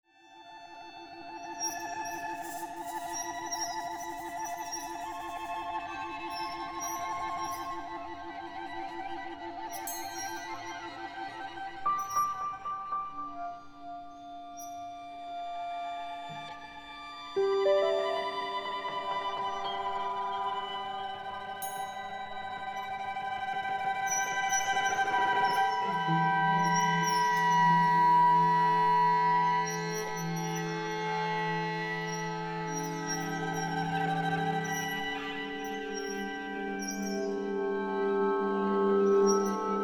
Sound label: Whispering chords